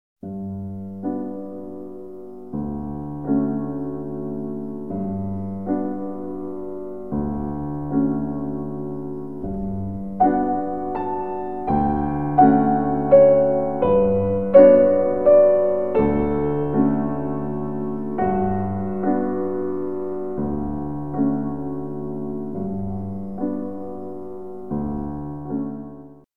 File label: snail; AnimalAntics